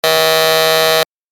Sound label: Wrong buzzer